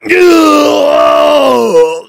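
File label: heavy pain critical death; voice packs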